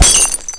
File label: bulb, impact small